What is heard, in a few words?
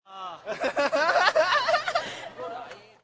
epic laugh